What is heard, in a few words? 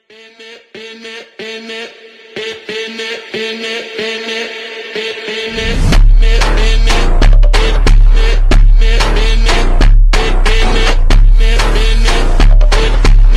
sigma bgm attitude bgm trending ringtone viral ringtone